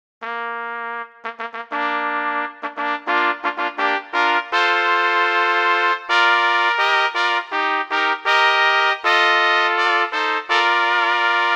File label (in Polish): Hejnał na 3 trąbki